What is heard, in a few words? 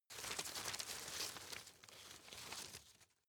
grab rustle soft; berufe; foerster